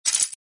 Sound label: AddChip